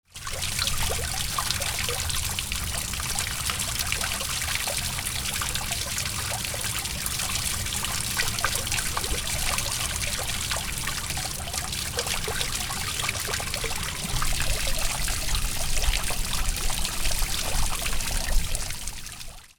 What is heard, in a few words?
WATER